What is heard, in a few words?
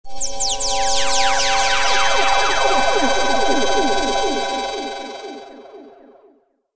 sweep